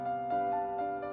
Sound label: Music Only; Electa Quinney